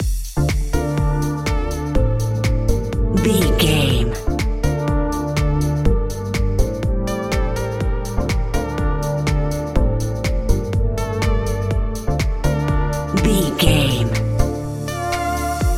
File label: modern chart pop dance music; Modern Chart Pop Electro Music 60 Sec; Catch 22 Music Network